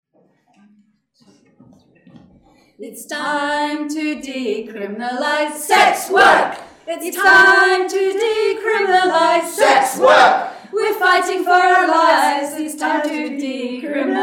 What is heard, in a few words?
Protest song